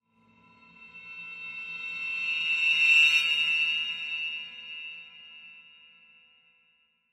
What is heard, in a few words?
Horror Cymbal Swell High Eerie; Scary Tones; Sound Effects:Horror